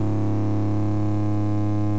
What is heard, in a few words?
car; CrossyRoad